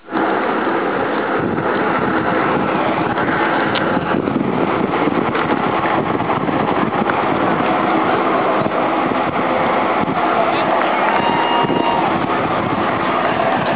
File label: nascar sounds